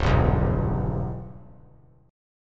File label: C sharp Minor 7th Flat 5th; strummed; chord; 1,2,2,2; JGuitar